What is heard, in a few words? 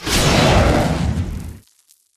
atlas dash close; Titanfall 2